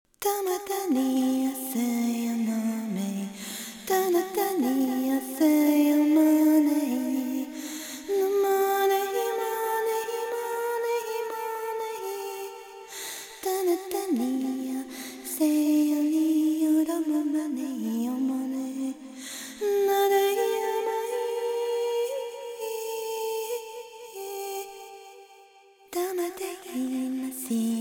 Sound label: Vox